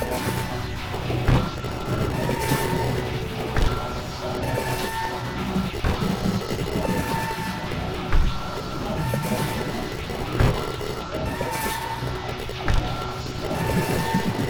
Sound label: Possession